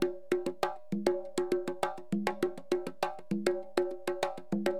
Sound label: CONGA, Perc; LiveAct Pool; AcidJazz Funk